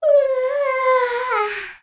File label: Yawn; Midna